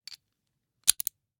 Rachet, vanadium steel, 8in, standard, locking, clicking, mechanical, new, socket lock, rotate; Eiravaein Works; Vaeyan IV